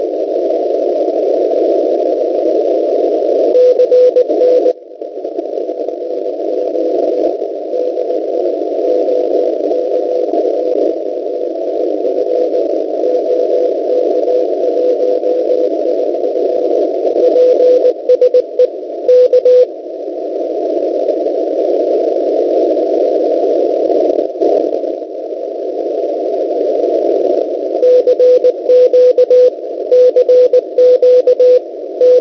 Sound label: 3520.00 cw